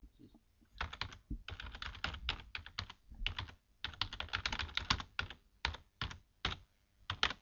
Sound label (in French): clavier